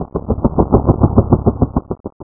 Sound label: Wing Flap; Realmz